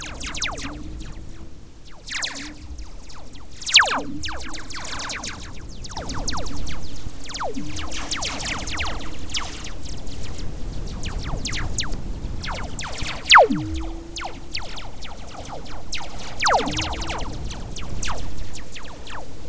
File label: WFR audio emfisis